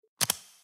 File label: close phone